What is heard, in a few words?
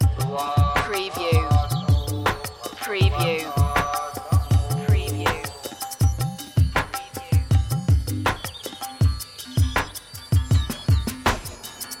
fertile land loop